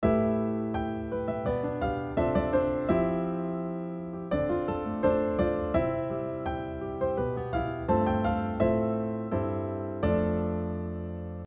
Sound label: harm mel